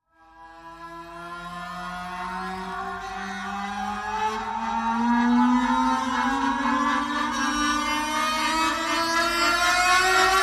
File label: Dramatic Strings Rise